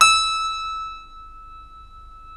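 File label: E 5 clavinet, Keyboards Acoustic; USB Soundscan vol.17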